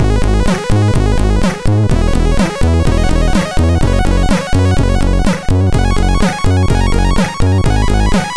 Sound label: sid MOS6581R2